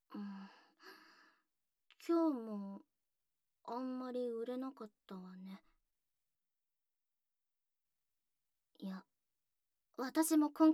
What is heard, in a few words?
Off SE, ASMR